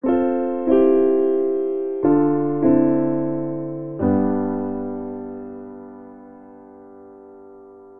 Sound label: Progression Waltzing 3/4 I - ii - V C Major 90 bpm, Grand Piano; MIDI Clips; Ableton; Factory Packs